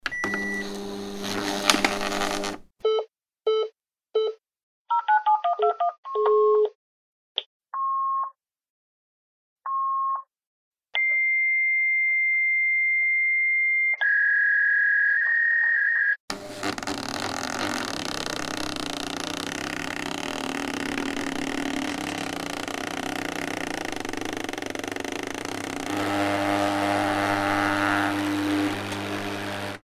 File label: fax transmission seq